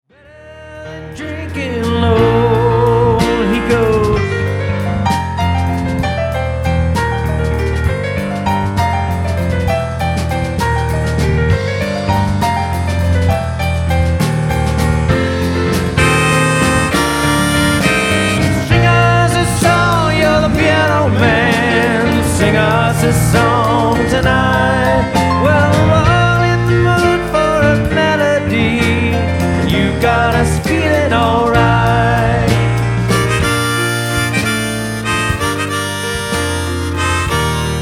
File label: Piano